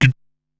"Chhi", Korg Wavestation SR, Single Hits

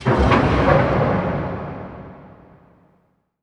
JETTISON, PERC; NorthStar - The Wizard VOL-2; TSAR PERC

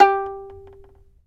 fiddle pizz G4 L; Sampleheads; Whole Lotta Country 1 & 2

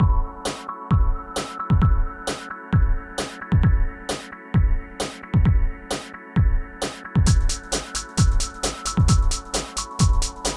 Hardcore Breakbeat, 132 Emin; Big Fish Audio; Hardcore Breakbeat Action

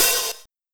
Linn, LinnLinn Drums

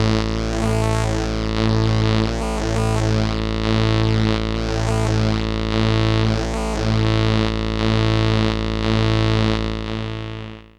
SYNC A, SYNTH FX; AMG; Global Trance Mission VOL-2

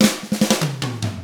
ROCK 6-8, Drums; ILIO; Double Platinum Drums 1

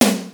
TEKNO; Rubber Chicken Software Sample Bank

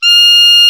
KLARINET, Alpine Volksmusik; Best Service

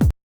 kick, Hardcore Breakbeat; Big Fish Audio; Hardcore Breakbeat Action; Decimater

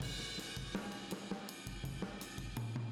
Boogaloo Fill Room M, 82 BPM, Drums; Sonic Foundry (Sony Creative Software) - Drums from the Big Room The Mixes

